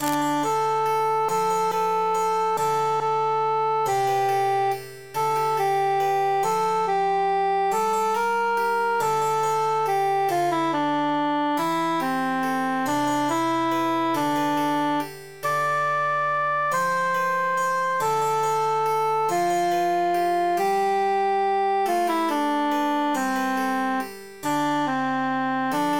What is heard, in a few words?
altus emphasized; pucelete